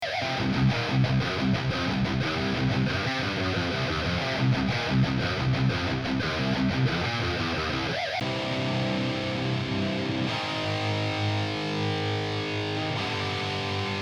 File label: guitars